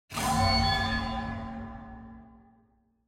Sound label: Horror Accent